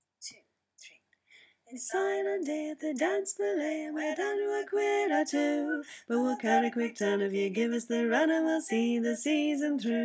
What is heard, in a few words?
Sign On Day harmony, Folk Choir music